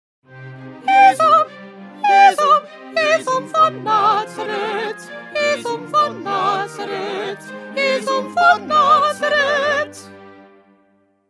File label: Soprano; Bach; St John Passion